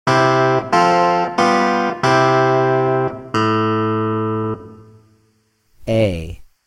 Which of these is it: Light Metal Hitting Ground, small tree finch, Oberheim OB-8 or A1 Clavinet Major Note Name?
A1 Clavinet Major Note Name